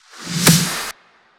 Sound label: Snare